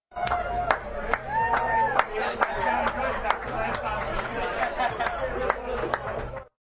Small Crowd Clapping, Cheering and sporadic clapping of a bar crowd after or before a perfor; Applause